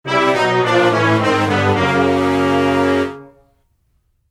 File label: game over